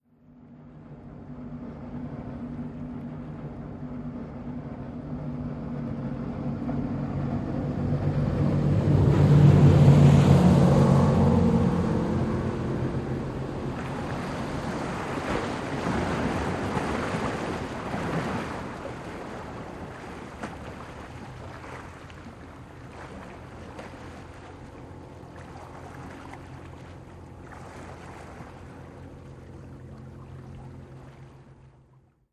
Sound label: Military Pbr: By, Close-up, Medium-speed. Wake Splashes On Moored Boat.